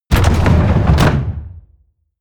mechanical lever wood large up; industrie-wirtschaft; mechanik

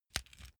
small pepper grinder pressured click ring; industrie-wirtschaft; gastronomie

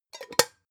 pot lid close plop; objekte; topf

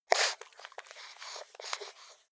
pug smack; natur-tiere; hunde

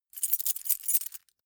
key ring mid size rattle hard; objekte; schluessel

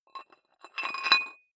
dumbbell thread put on weight soft, fitnessstudio

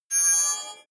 hologram appear, energie

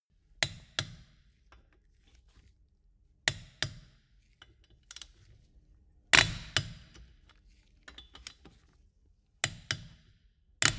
wheel change sequence screw and hammer; schrauben drehen hydraulik

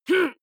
male fight groan short; comedy-cartoon; soundpool

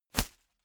whoosh paper scrap flutter hard; swooshes-swishes

